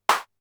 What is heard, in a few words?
res clap, Polivoks, drum machines